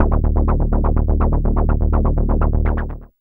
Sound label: SYSTEMAT D#2, Analog Rhythm; E-MU Producer Series Vol. 6 – Analogia Project (CD 1)